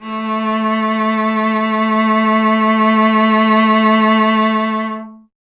STR cello A, Cello; Miroslav Vitous; Solo Instruments VOL-1

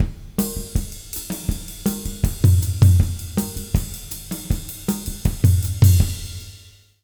160, Jazz Latin Drumloops

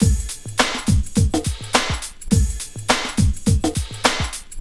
LOOP2 R, Drum Loops; Big Fish Audio; Prosonus 500 Drum Loops

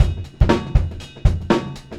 70s breakbeats loop; USB Soundscan vol.46; AKAI

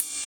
Ride; Equipped Music; Loungin House

